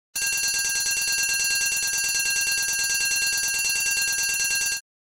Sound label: Fire alarm bell emergency steady ring short